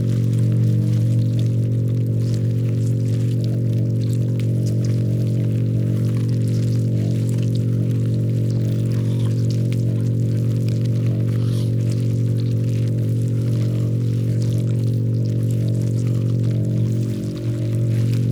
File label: ant ship construction idle; biogenesis